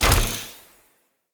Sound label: Bow Ranged Shot; Weapons; Player; Bows; Grand Father Bow